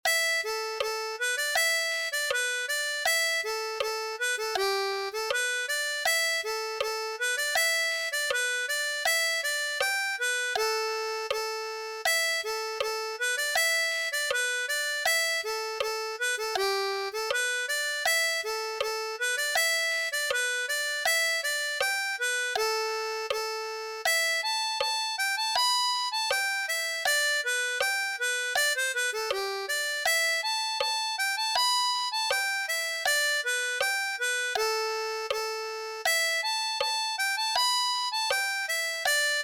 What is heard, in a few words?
the glin cottage polka; SlowSession; Polkas